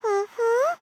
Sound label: "mhm"